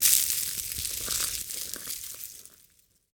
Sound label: Platform Appear